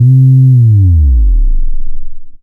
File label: Single Electric Basses, Dance Floor Bass